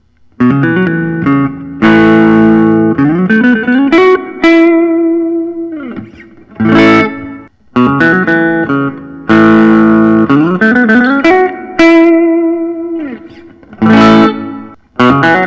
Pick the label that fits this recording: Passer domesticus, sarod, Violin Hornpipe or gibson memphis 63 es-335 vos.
gibson memphis 63 es-335 vos